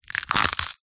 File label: horror hand or bone crush crunch; zapsplat